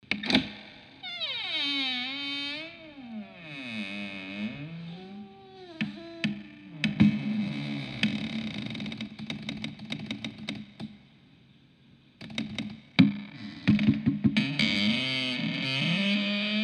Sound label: Spooky Door Sound